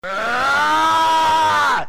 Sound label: Scream (Loud)